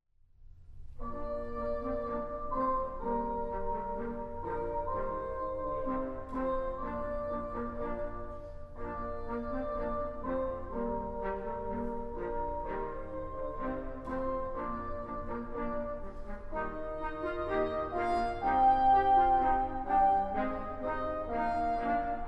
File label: Ancient Airs and Dances, Gagliarda, Saltarello e Canario, Brass & Organ; V. Laura soave con